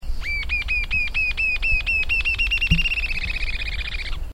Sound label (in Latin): galbula ruficauda